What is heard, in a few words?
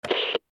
button